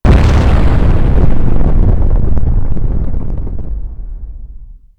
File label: explosion big, heavy bomb; explosions